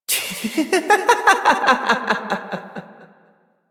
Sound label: Laughter